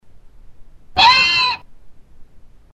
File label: BABY GHORN SCREAM